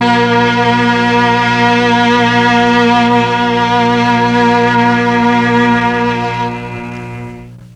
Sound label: MEL STRING; ILIO; Propeller Island Legendary M400